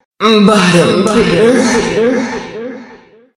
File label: music stinger player death; killstreak music